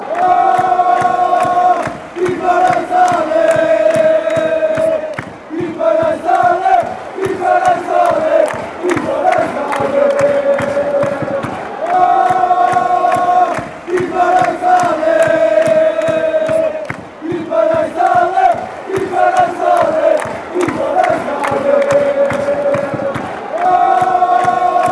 guimaraes allez fanchants

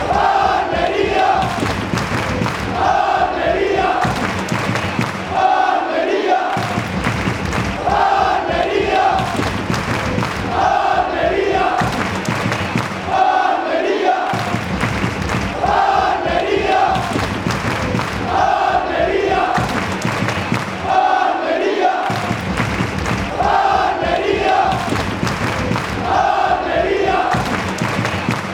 almeria almeria claps

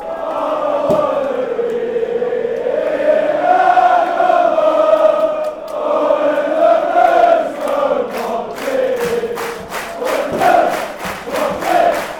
oh when blues slower, fanchants; lcfc

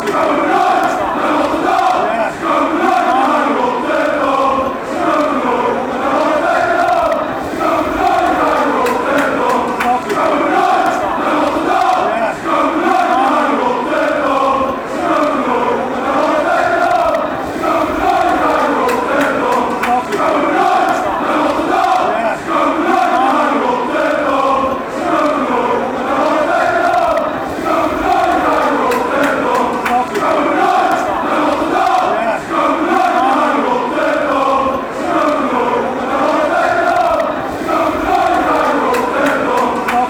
chant, fanchants; feyenoord

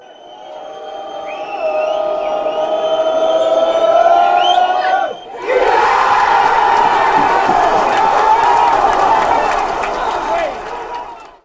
penalty fanchants

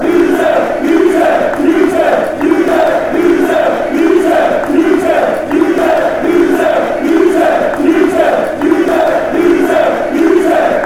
fan chants; hughsey

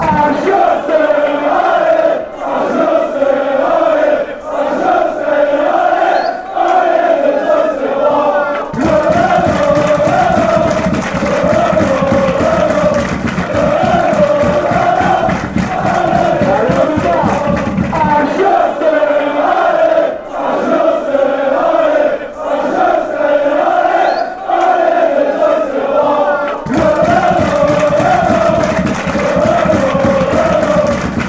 allez, fan chants, football song; auxerre

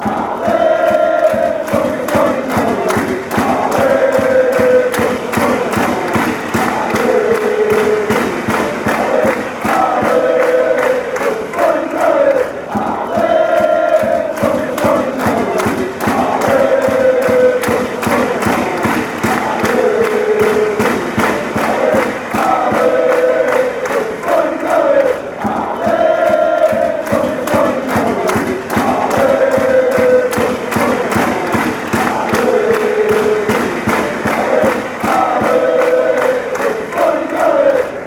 allez go allez, fan chants; sporting

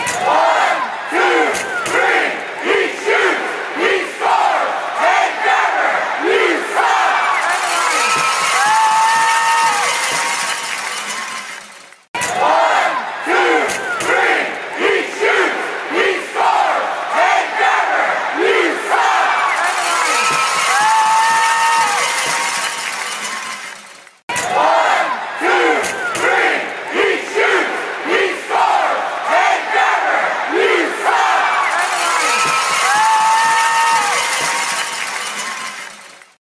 after goal chant

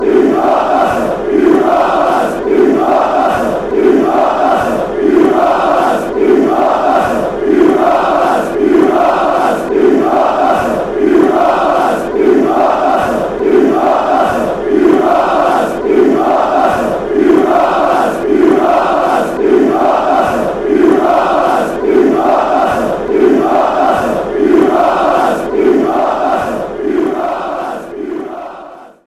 you fat bstard fanchants; cfc

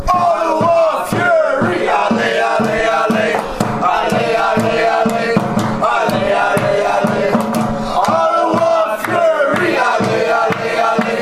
ottawa submarine fanchants

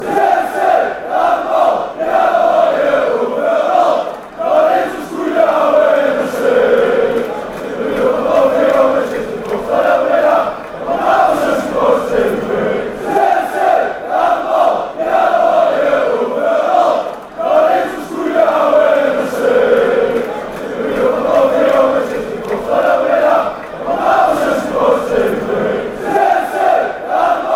chant, fan chants

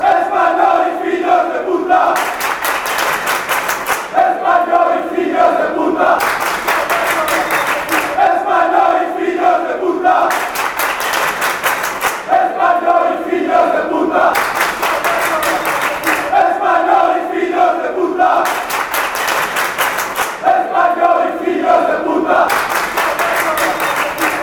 spanish, chants

